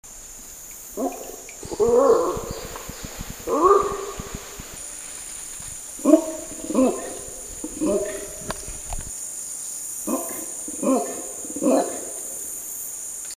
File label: Ahoor Call